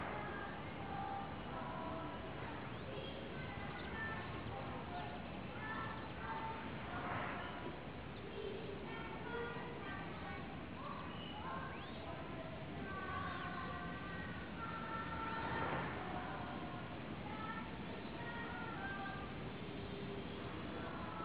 Amb School; Street Cricket Champions; PSP

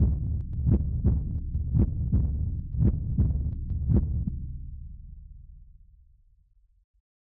Heart Beat; Temple Layer

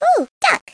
duck

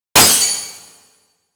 Attack Guard

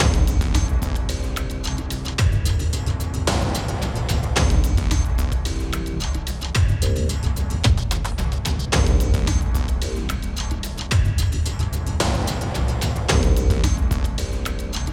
Dungeon World Main LOOP; Forced Showdown

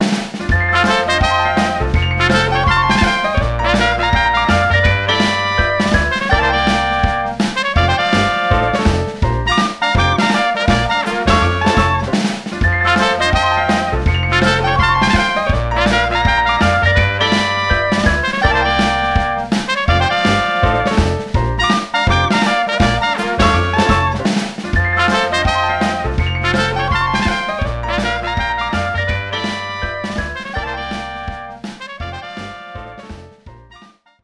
Hub Diner Intro, Music; Frog Music Streamed

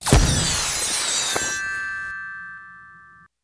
Fx Transformation; Mansion

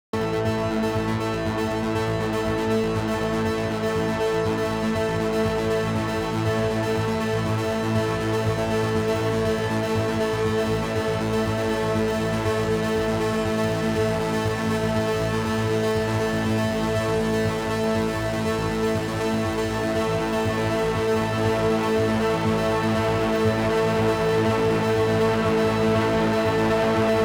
Elevator

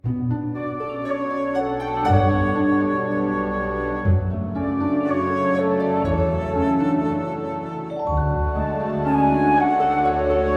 Sleep; lego the hobbit

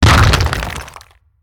Ground Shattered earth

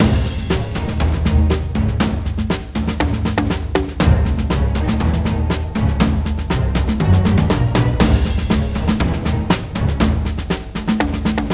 Lava Level loop; Rezrog; Depard; Gems of Rezrog

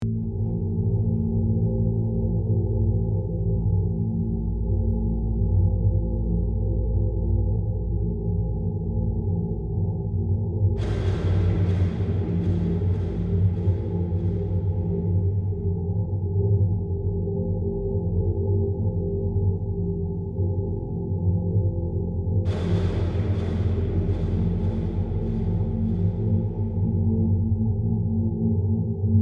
Amb Vslice Ctrl Room

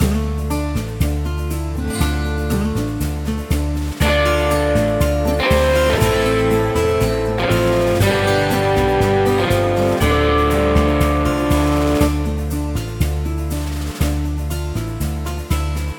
LOOP game over; Western

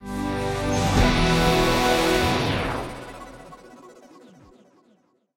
Completed; Rocksmith

